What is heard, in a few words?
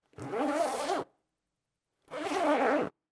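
zipper fastener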